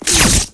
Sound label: plasma dryfire; weapons; Tribes2